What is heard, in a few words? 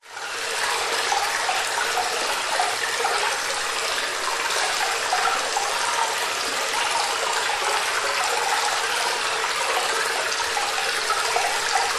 nature cave ambience water run trickle drip reverb